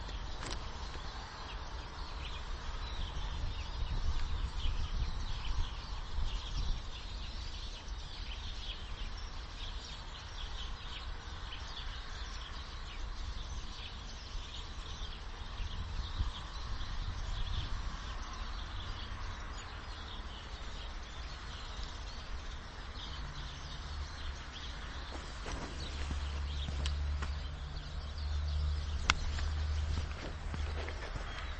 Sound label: Passer domesticus; SETE